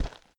Ocean Ground Thud; blastmorph pinball; xbox series xs

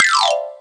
Cake Release; cake drop

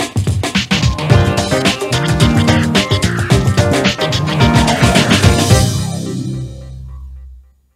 End of Quarter; NBA Hoopz

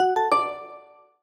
SFX Recipe Reached; Ellen's Garden Restoration; android

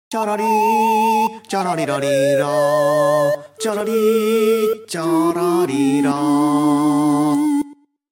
Jingle Gameover; Dandy Dungeon Legend of Brave Yamada

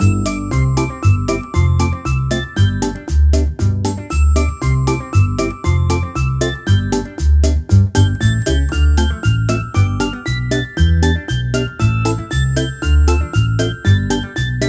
bgm bubble; game rip; line bubble

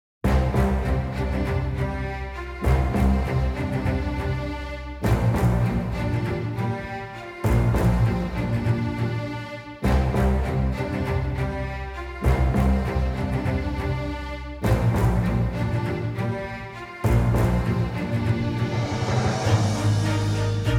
Busy; Sword of Convallaria; Android

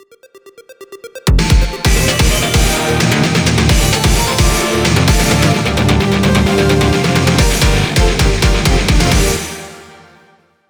level intro medley

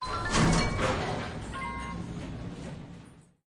Mineshaft Elevator Start Downward

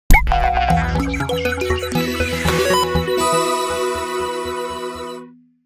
Beep Logo Animation; Radirgy 2

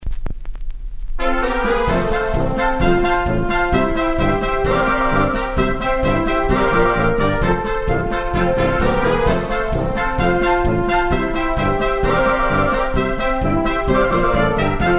grammophone german brassband; jack keane 2 the fire within windows 2012

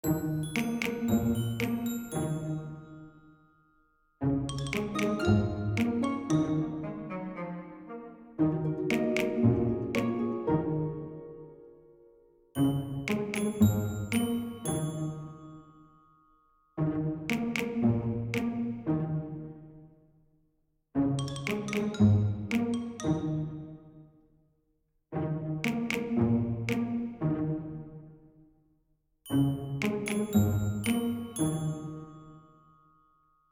Forest Comic; Bewitched; macOS, PS Vita, PS4, Switch, Windows, Xbox One, Xbox Series X/S

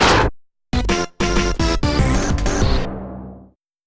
Miss; paper mario the thousand year door complete; Super Bowser Bros.; GameCube version

